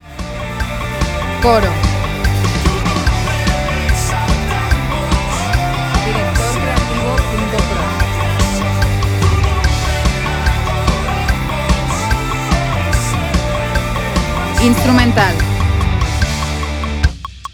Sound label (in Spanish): Más Fuerte Que Nunca, D, 146, 4/4